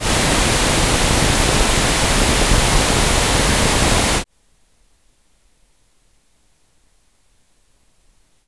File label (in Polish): szum faza-przeciwfaza